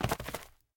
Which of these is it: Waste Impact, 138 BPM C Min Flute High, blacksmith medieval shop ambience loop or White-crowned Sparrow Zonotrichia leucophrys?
Waste Impact